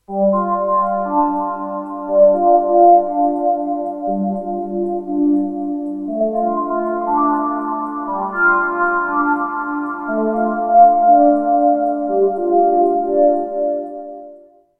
off beat choir synth 120

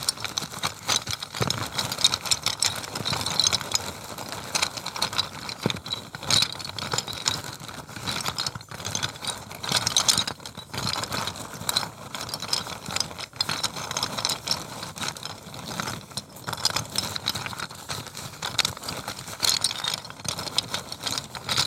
rummaging

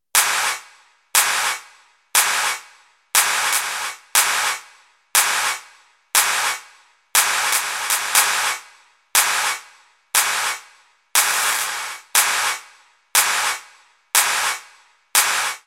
synth clap loop 120bpm

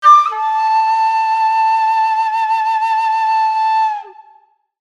whistle d a

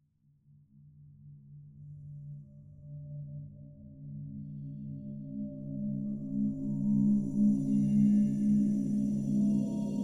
spooky sci fi

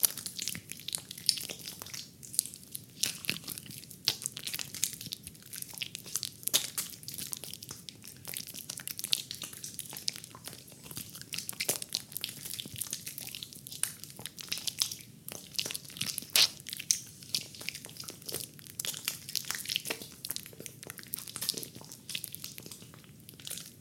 squelchy sounds

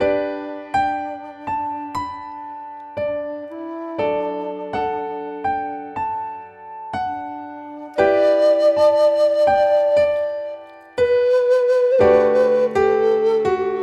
playing music 120 g

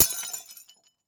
smash

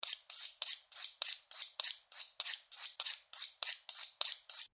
sharpening 100bpm

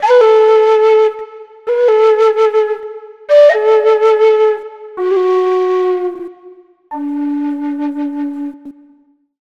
whistle low amaj

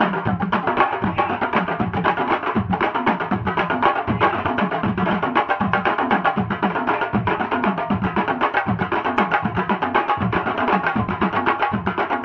sabba loop 3 4, 235bpm

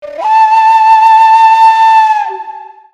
whistle tongue d

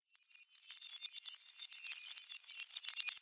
reverse shells

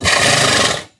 snort

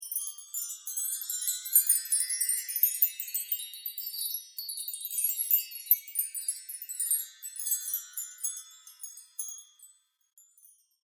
chimes

